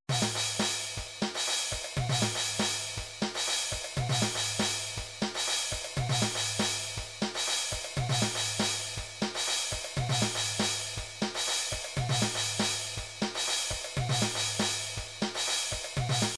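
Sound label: drums pattern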